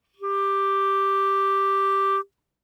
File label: Bb Clarinet ff G4 stereo; Woodwinds